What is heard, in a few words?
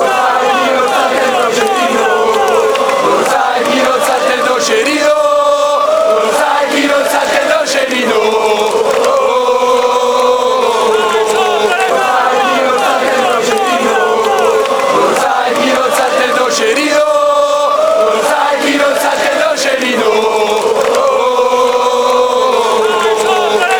lo sai chi non salta e, fan chants; nocerino